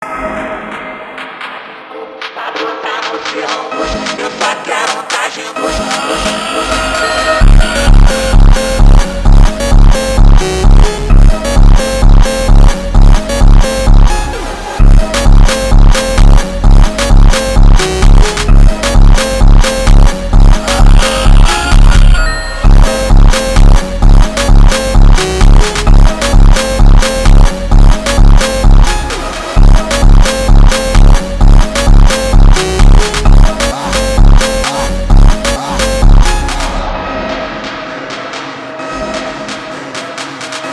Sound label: (Brazilian Phonk)